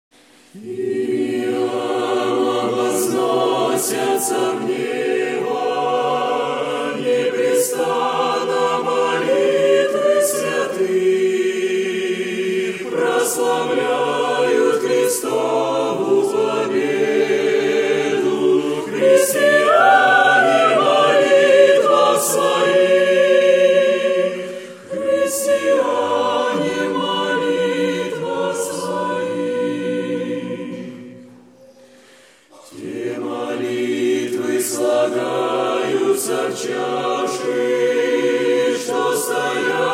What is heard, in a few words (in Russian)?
групповое пение; Молодёжное общение г. Ковель